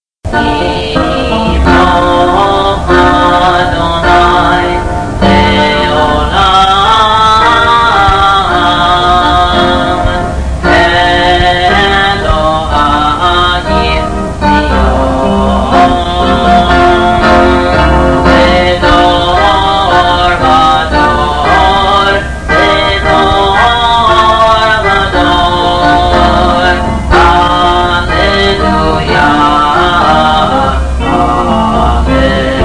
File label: Yimlokh Accompanied